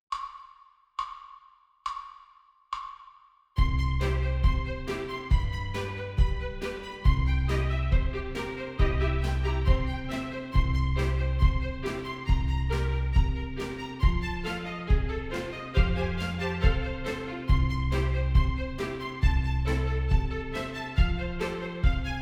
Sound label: Intermediate Daily Warm up A Horns in Fifths